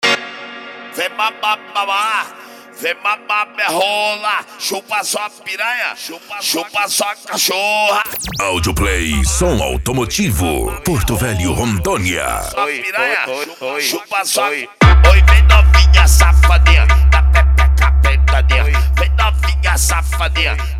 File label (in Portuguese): esp funk mega bass